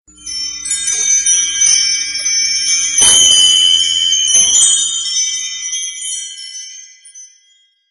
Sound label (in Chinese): fengling